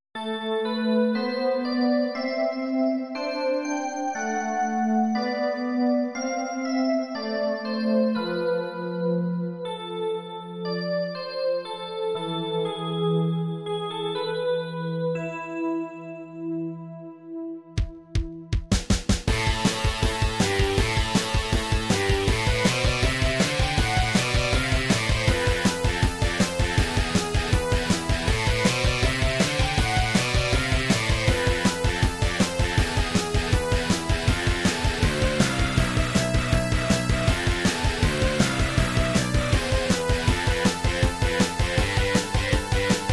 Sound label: battle synth rock; Game System Nintendo; Final Fantasy Ii (japan); Solidstone